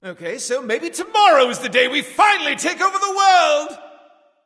death; cupid

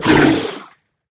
alien scream; serqet; smite

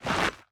movement step; smite; odin

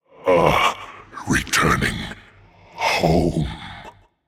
death; smite; olorun

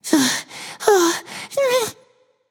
breath, voicepack; smite; jorm

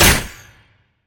melee impact; smite; vamana

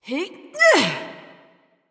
grunt attack; Smite; athena

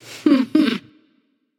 heh heh; smite; skadi

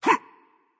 gruntjump; smite; voicepack; mercury

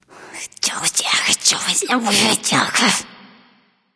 grumble; smite; neith

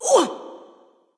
grunt hit; smite; voicepack; skadi

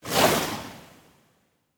umbrella throw fire; smite; vamana

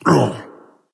grunt hit; smite; voicepack

